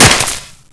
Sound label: gravel, debris